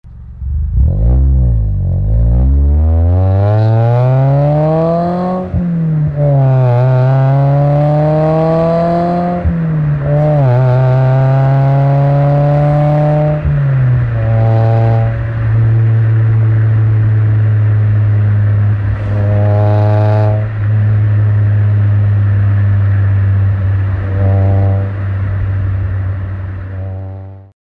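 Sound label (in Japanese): legal, 92LEVIN+HKSリーガル 市街地走行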